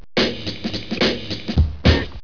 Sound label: kick